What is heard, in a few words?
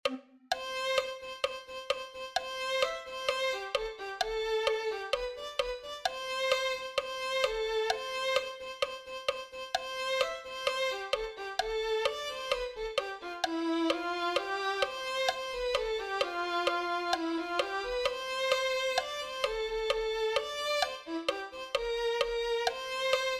violin 2